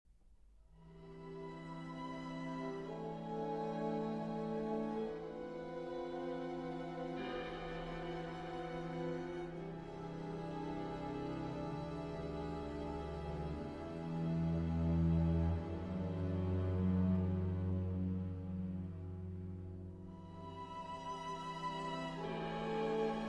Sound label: Double Concerto For Violin, Piano, and String Orchestra with Percussion